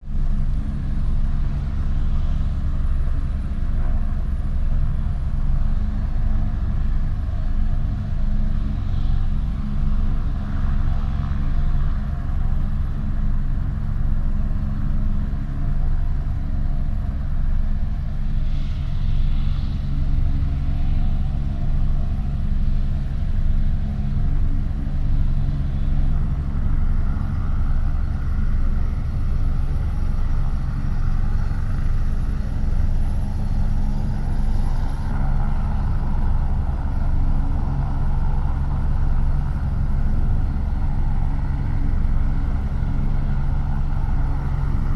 heliport

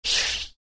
dart

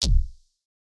beep

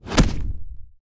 punch light

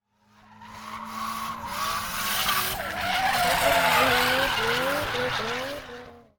car drift

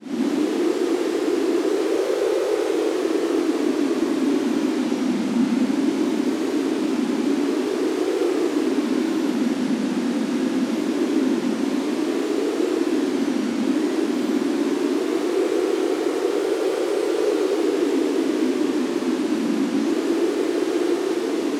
snow storm

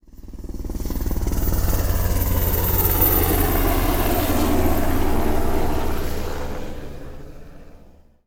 helicopter pass

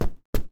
shoes tonton

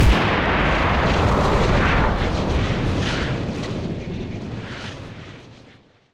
sniperrifle slow firing

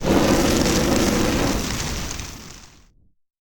magic flame

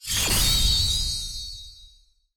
shakin

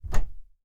locker open

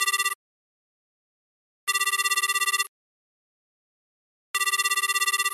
phone tone

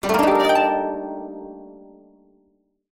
koto glissando

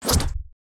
punch real swing

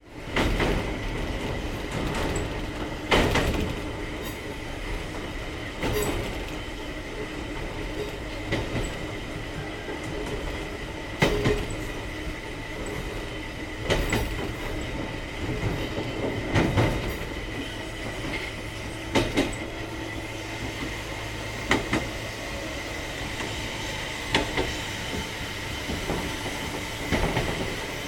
train driving

